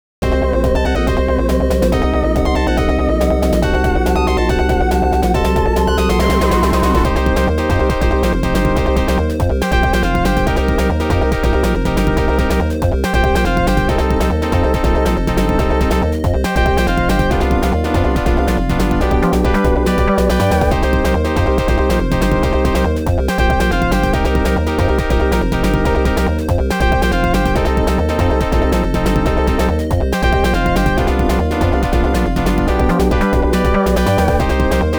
Boss Battle; Mega Man The Wily Wars; The 16bit Audiophile Project; Genesis

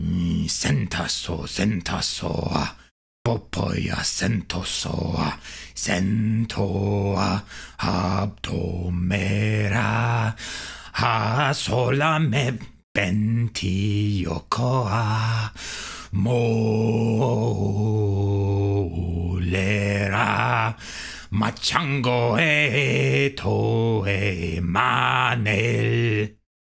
Male Enemy Song

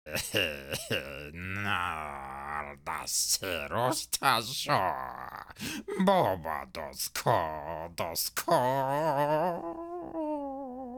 wounded loop

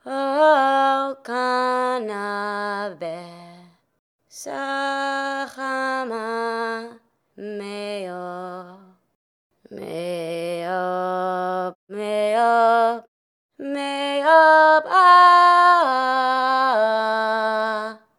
sing

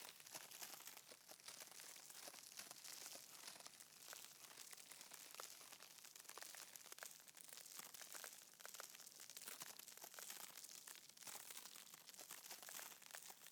frostbite loop; ui

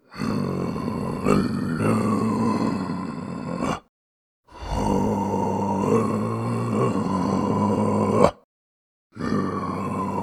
Loam sing; Hollow Knight: Silksong

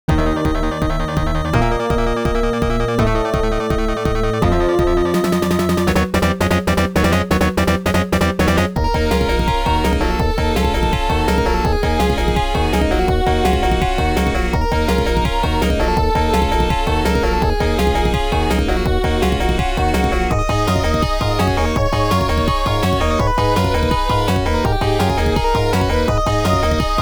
Boss Battle; Mega Man The Wily Wars; The 16bit Audiophile Project; Genesis

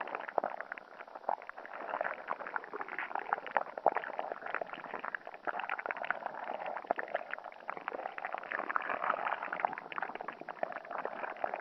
swamp water loop when close; Hollow Knight: Silksong

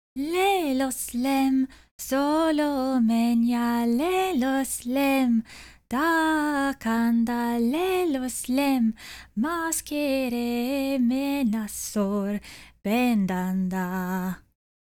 Pilgrim Female Sing; Hollow Knight: Silksong

